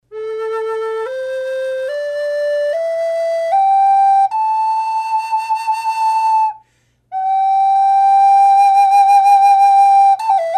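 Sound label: natural, flute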